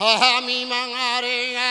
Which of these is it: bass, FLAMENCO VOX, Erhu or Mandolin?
FLAMENCO VOX